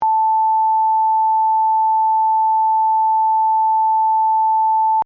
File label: SINE WAVE 880 HZ MINUS 12DB LOOP, Reference tones; Reference tones that can be used to test hearing and callorbrate equipment; Tone Sound Effects